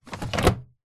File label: household washing machine drawer tray slide close; zapsplat